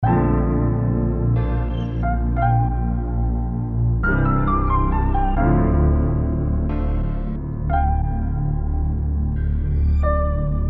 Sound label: COSMOS 90BPM